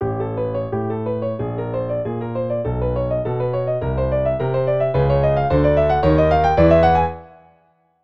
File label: Piano; The Legend of Zelda Ocarina of Time